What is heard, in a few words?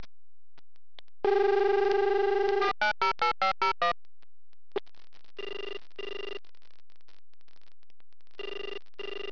DID ring